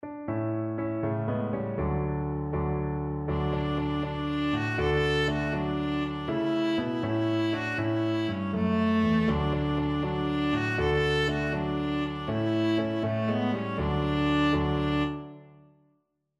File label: mulberry bush, viola